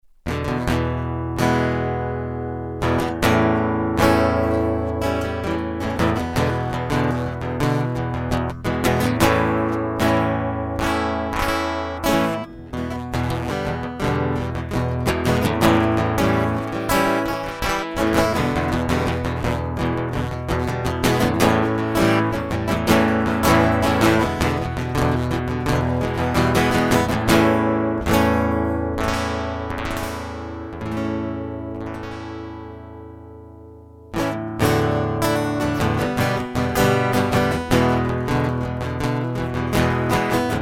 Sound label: Say Bye Babe Guitar Loop